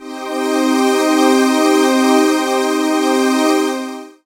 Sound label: Distant Pad Rev; Zero-G Jungle Warfare 1, 2 & 3; 90 s Sample CDs